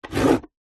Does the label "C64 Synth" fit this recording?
No